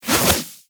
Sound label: Add Mineral To Meal Throw Whoosh; Alchemy Lab; Warframe; Hub; Liset